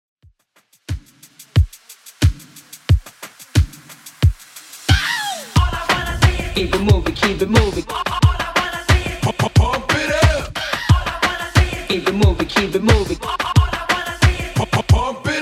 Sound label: 90s Mixshow Redrum 90bpm